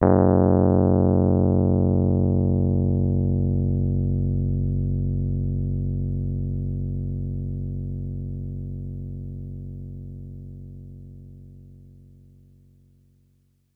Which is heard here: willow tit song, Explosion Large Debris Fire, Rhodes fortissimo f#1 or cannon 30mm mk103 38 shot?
Rhodes fortissimo f#1